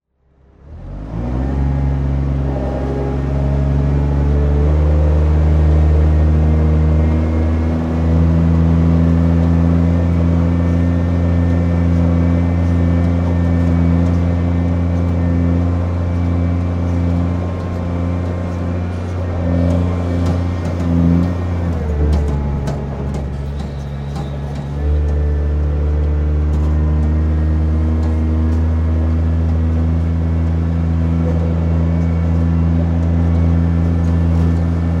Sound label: INDUSTRY CONTAINER YARD